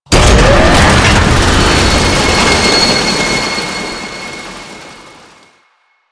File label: Elevator Enemy Start; JadeShadows; Ascension